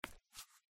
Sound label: Tile step; The Joy of Creation Halloween